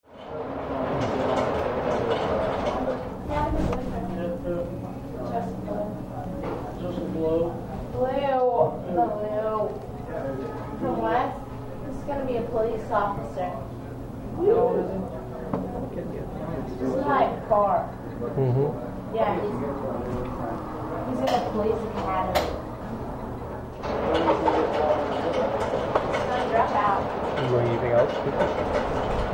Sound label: diner